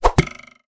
bow

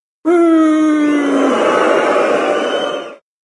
buuhhhh

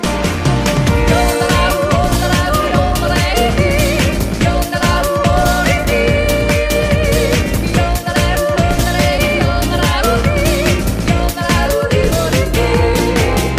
soundtrack stage; tekken 6; hidden retreat sheep grassland

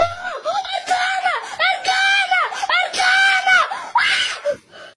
aaaaa

